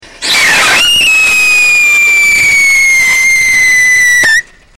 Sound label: copper oxychloride potassium benzoate, rockets whistle tests; Core Burner; Lots of spikes and spring bounce on test stand. Sound is higher, less raspy than other catalysts. It is very fast burning.